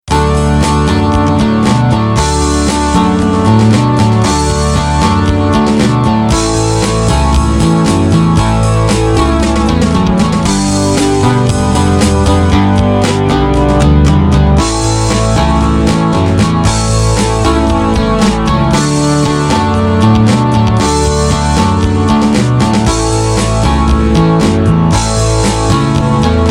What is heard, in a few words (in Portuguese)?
instrumental rock pop